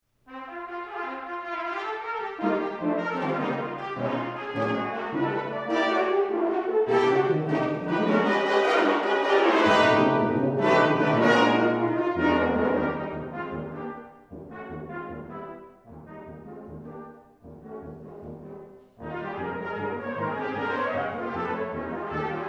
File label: suite iv danse villageoise